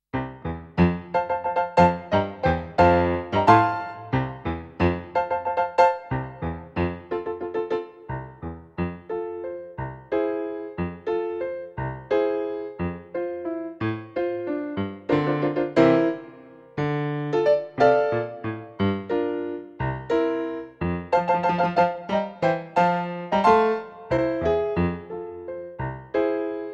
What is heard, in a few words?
Piano